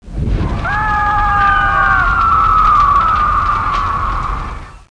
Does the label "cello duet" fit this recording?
No